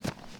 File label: step grass, footsteps